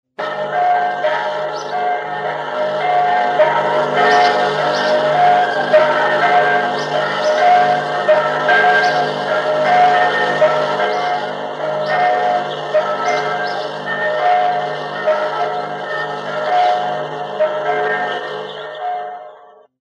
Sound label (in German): Dreiergeläut; Sächs Haugsdorf